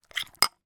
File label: food spoon in glass bowl cereal wet; zapsplat